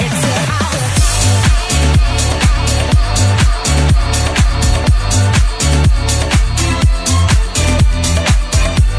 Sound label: String